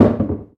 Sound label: Bomb Land; The bomb landing; Eldin